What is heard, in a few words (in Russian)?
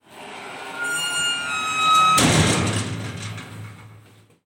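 закрытие металлической двери со стуком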